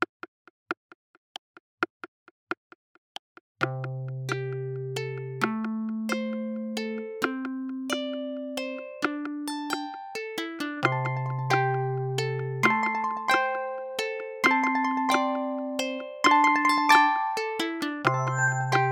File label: ringtone